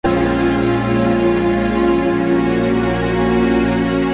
melody

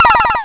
win, slots

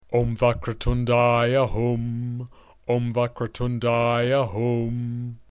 Om Vakratundaya Hum, mantras

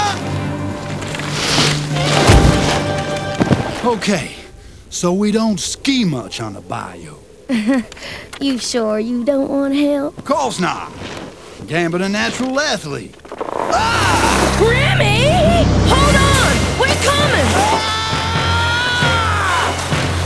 skiing

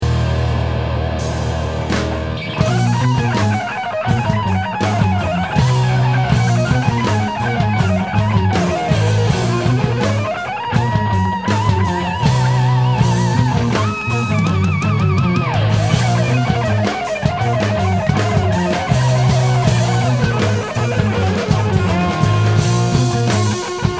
outro solo

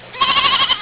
lamb

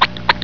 mag suck

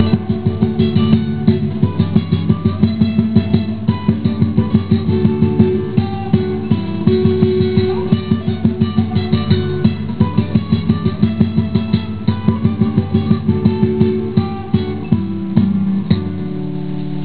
tambora

